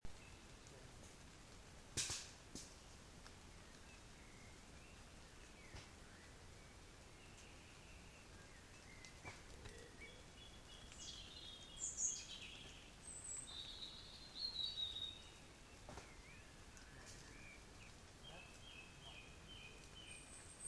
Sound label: woodland camping